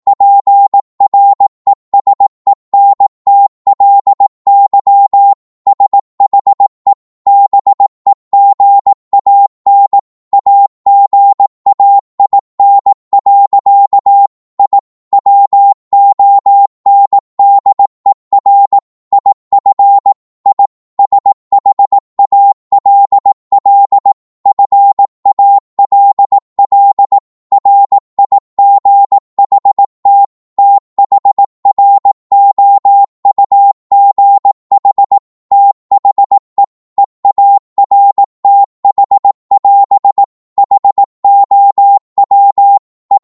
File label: 18wpm, Morse